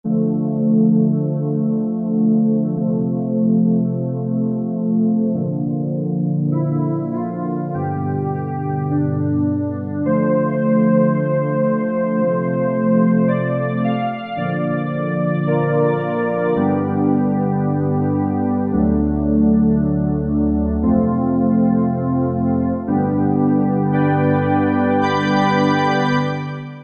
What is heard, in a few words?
Soft Pad, Korg T3